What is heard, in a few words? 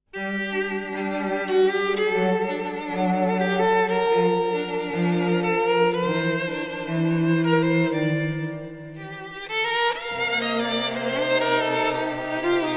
string quartet excerpt